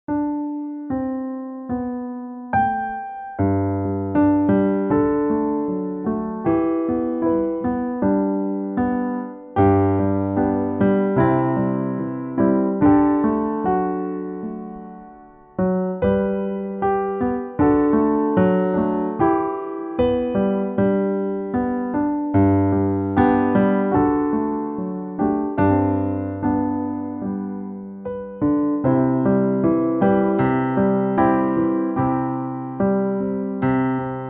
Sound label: Piano; Jock O Hazeldean